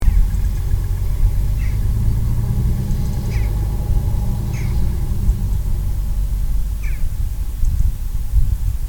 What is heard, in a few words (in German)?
dohle